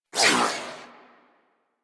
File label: Primary Tail, Weapons; Sapient